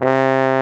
BARITON; Best Service; Alpine Volksmusik

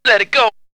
Vocal; DNB BASS PAK

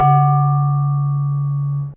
Gender C3, Fairlight CMI IIe, Mallets

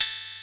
SK1 OPHH; 90 sSampleCDs; Crypton - DrumBase5000

